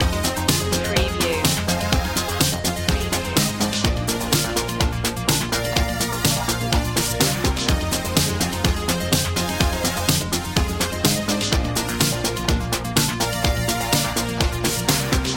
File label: lets go 80s loop